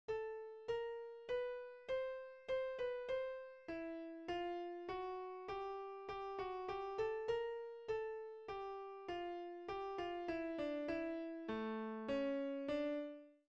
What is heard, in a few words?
Chromatic Fugue Excerpt